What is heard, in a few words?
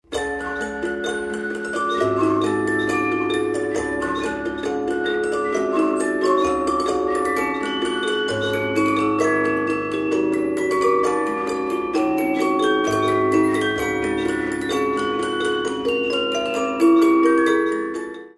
moulinet